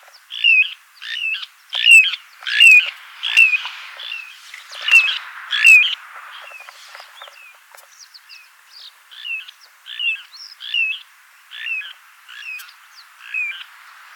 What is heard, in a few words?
Little ringed plover